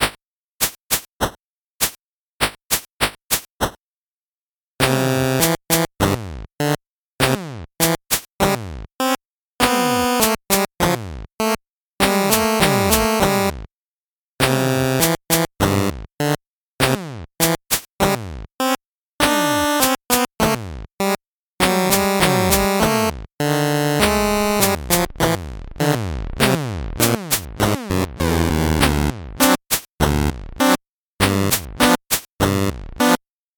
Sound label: 8bit bgm slow